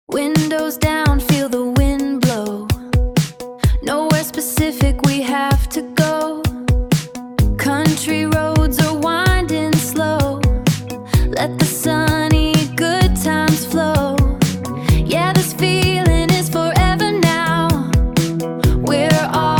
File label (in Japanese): 爽快感のあるドライブミュージック; 晴れ渡る空の下、オープンカーで風を切って走る爽やかでエネルギッシュなイメージ; 実際に生成された音源; Lyria 3; Gemini; 開放的なドライブにぴったりの曲